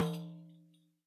RIQ; SoundBytes - Early Patches

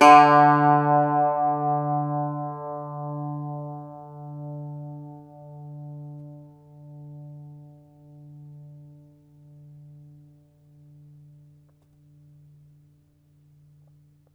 IRISH BAZ, Plucked Strings, Irish Bazouki

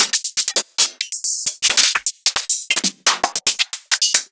Open Hihats

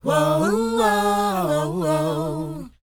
WHOA D#, Vocal, R&B & Dance; ILIO; Vocal Planet

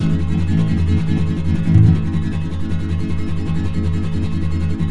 A# MJ, Guitars; Spectrasonics; Hans Zimmer Guitars VOL-2; FLAM TREM CH

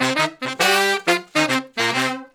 Horn Section

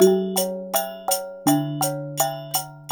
WYANG L, Heart of Asia; Spectrasonics - Heart of Asia CD2; W.KULIT

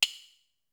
DANCE SET, DRUMS

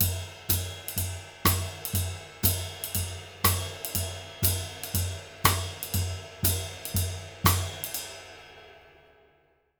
120 BIGBAN; Univers Sons; Jazzistic

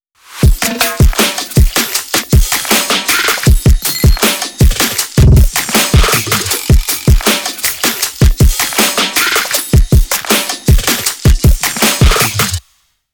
158 sixty-eight drum tracks

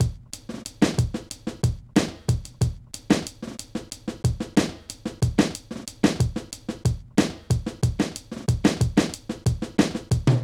Drum Loops, Minimal; Zero-G Smoke

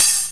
creamin hat; E-MU Formula 4000 Series Vol. 2; OrbitPercMaster

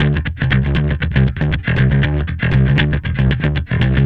Bass

